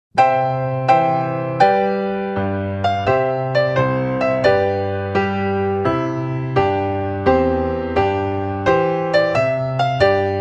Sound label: trumpet tune; clarke